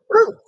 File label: dog barking